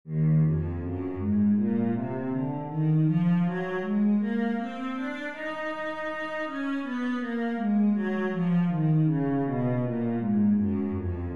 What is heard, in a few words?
cello, e flat minor